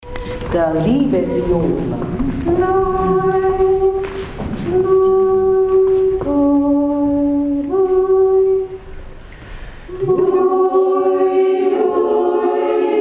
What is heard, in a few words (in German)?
der liebesjodler